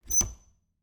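Refrigerator, old fashioned, wooden, steel, brass clasps, hinges, open; Eiravaein Works; Vaeyan IV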